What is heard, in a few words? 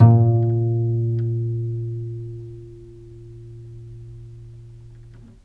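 VIOLE PIZZICATO A#2, Historical Instruments; USB Soundscan vol.23; AKAI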